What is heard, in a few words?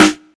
good steel snare drum sound D# key, Royality free steel snare drum tuned to the D# note.